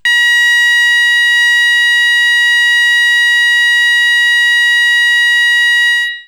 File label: Sounder